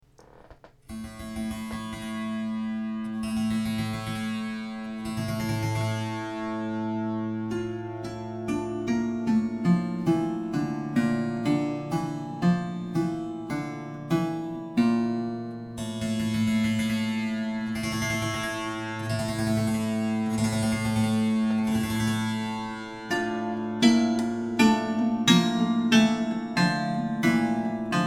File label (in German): klangbeispiel monochord 120 koto